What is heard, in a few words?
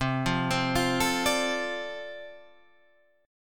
D-Minor 7th C, chord